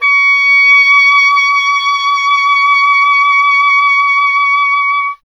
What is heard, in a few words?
WND OBOE, Solo Instruments; Miroslav Vitous - Solo Instruments VOL-2